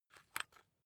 rock drill head remove regular; bohrer; soundpool

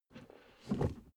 suv push forward gear straight soft slow; verkehr transport; autos

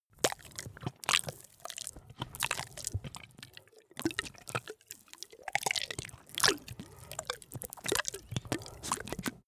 monster flesh eating; comedy-cartoon; soundpool

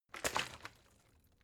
bottle water sparse shake single soft; berufe

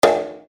fx pop; haus garten; wohnen

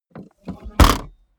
car bmw e46 exterior trunk close slam

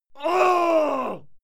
male fight scream pain; schreie; menschen; soundpool

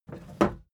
wood board handle roomy; objekte; holz

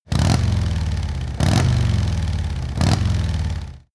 motorcycle harley engine low rev multiple hard

